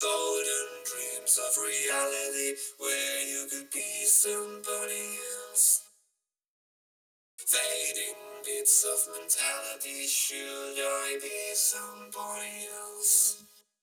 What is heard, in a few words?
break backing; 128BPM